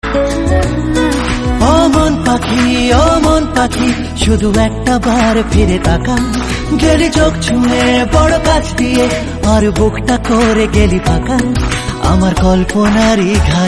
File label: indian bangla song